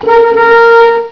horn

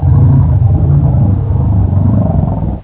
kingpin alert

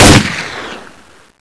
m16 fire

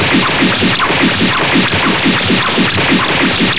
sword, weapons

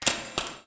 roof gate

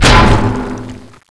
garg hit

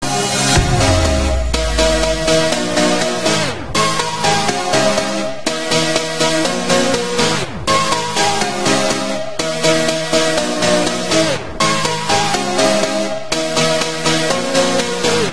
level; VHL Surf

